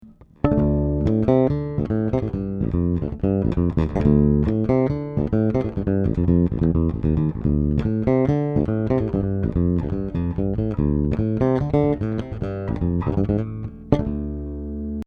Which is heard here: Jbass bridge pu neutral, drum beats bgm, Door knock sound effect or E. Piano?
Jbass bridge pu neutral